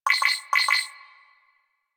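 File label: Hub Computer Beep; Warframe; Tenno Hub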